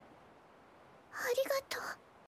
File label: ambience